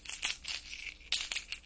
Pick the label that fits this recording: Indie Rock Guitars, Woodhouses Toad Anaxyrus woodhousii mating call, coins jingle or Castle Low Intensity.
coins jingle